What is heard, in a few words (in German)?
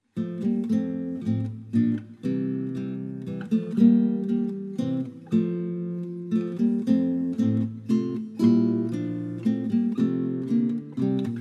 Gitarre Schneeflöckchen